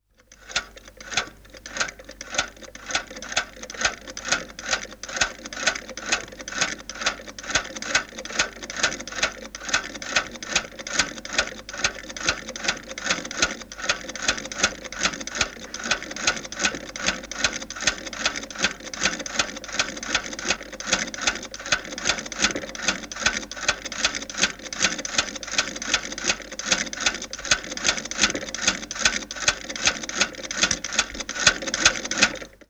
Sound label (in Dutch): naaimachine